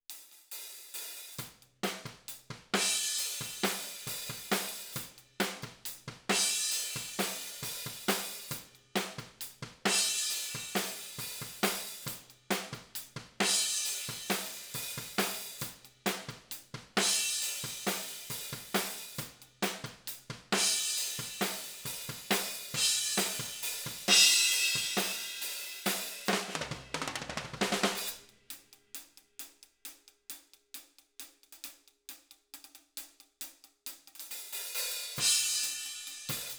Ride

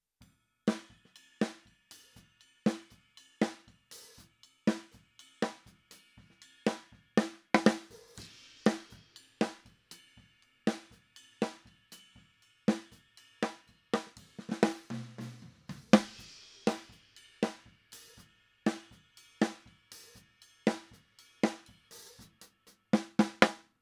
SNR

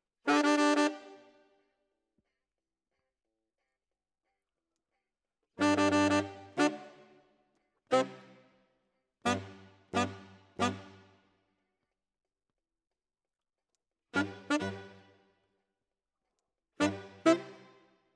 Tenor, RNR for piano

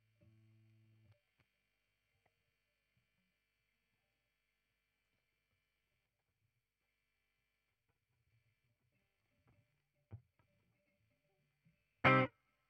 Gtr, Ragtime

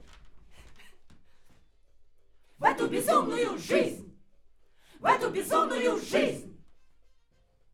Backs AKG LEFT

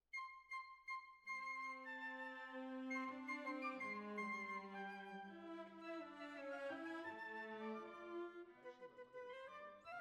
Violas Over

